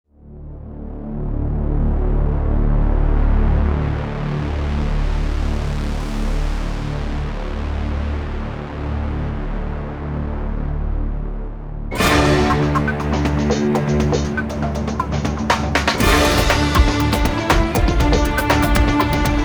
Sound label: Trailer Music; ZombieSmash!